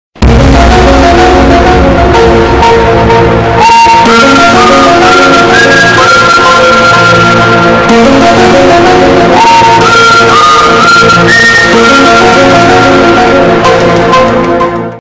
Hyper Distorted; Illuminati Confirmed; MLG

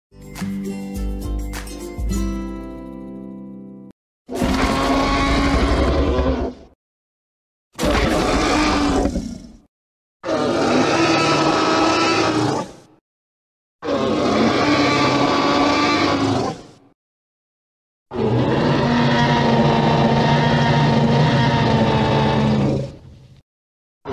Tyrannosaurus Rex Sound Effect

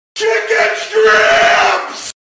Chicken Strips Sound Effect

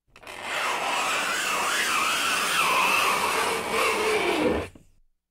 Fingernails Scrape Blackboard

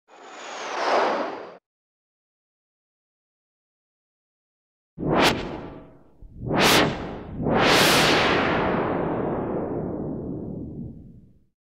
Spaceship Fly By Swoosh

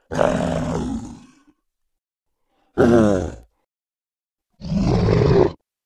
Werewolf Howl

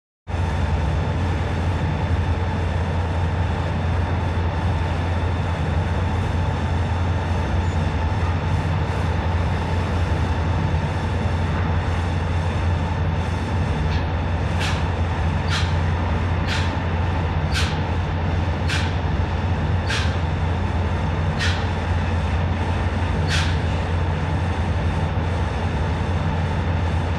High Machine Rev

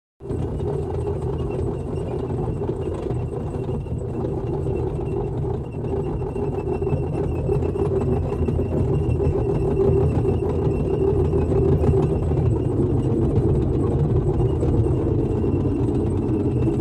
Tank Tracks Treads Sound Effects

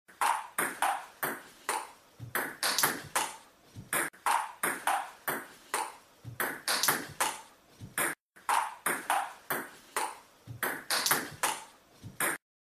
Ping Pong Sound Effect

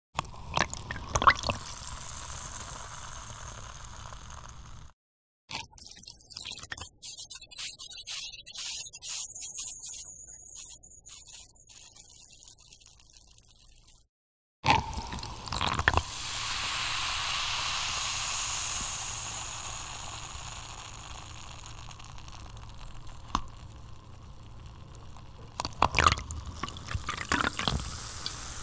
Coke Soda Fizzy Drink Sound Effect

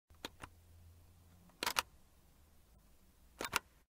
Button Press

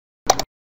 Click On

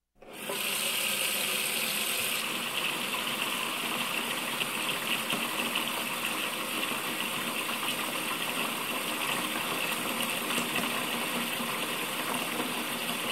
Filling Sink With Water Sound Effect

